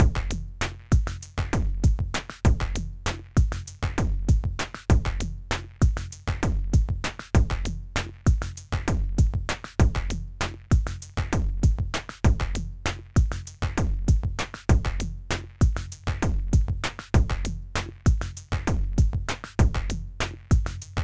Microtonic Pattern; Sonic Charge

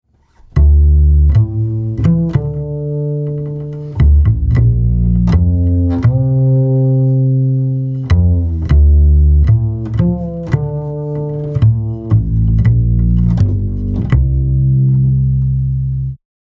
Double Bass Lim 6db; Chandler; Waves

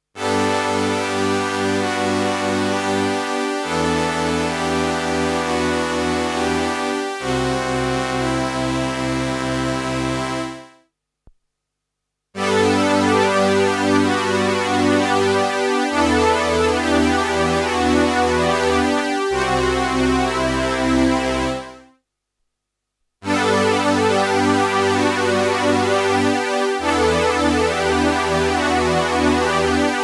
Juno60 Stereo Strings; June60

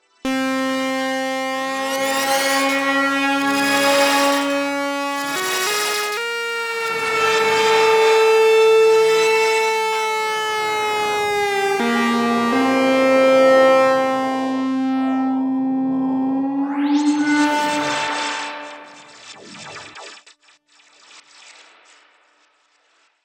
Strymon Magneto shift repeats spring lfo speed

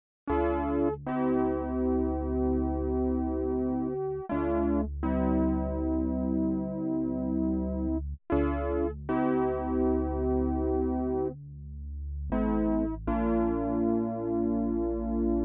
OB-Xa repaired

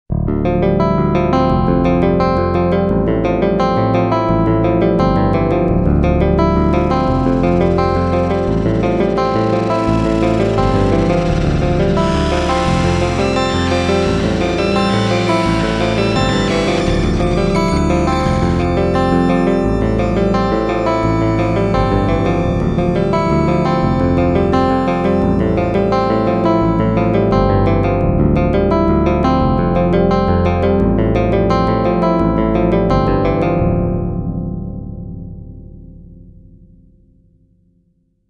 Sequential Prophet X Past In Reverse

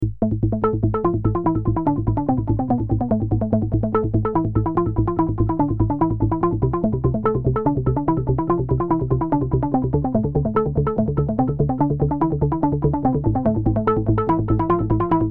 Sync Trance Seq Delay; Roland SE-02

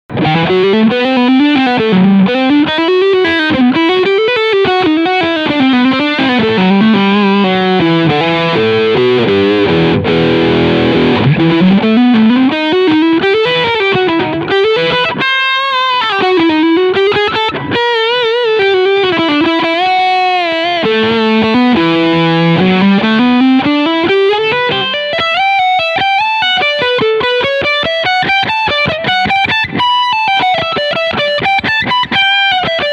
Overdrive Bridge Pickup; DAngelico Deluxe Atlantic

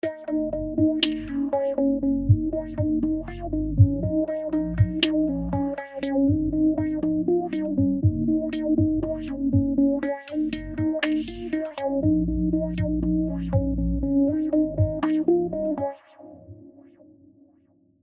FilterShaper 3 Ping Pong Wahsterizer

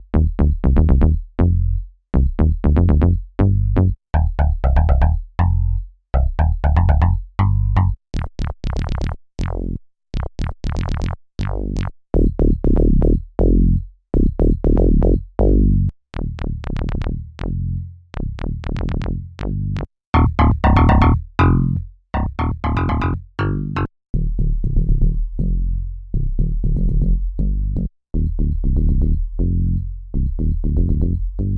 Yamaha YS-200 Electric Basses

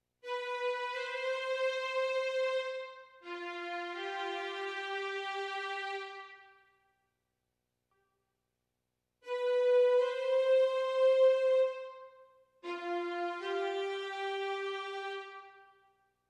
Arco Con Sordino

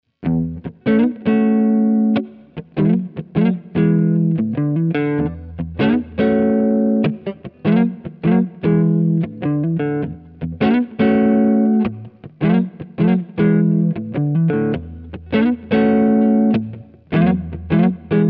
Neck PU clean rhythm; George Benson

